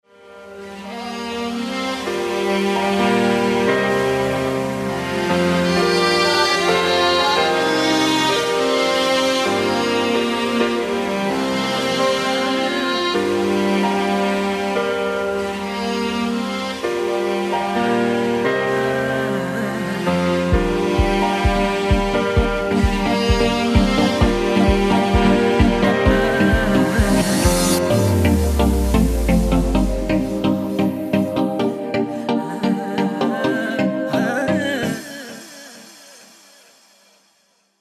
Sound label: dance music